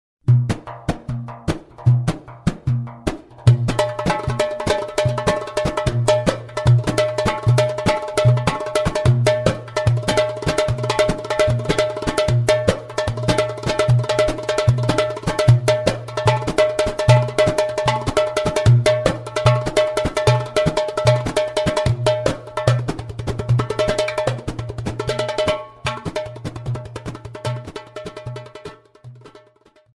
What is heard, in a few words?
Darbouka; darbouka tabla vol 1